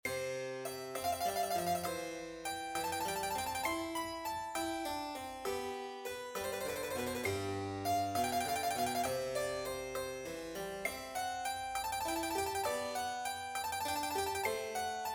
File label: audio click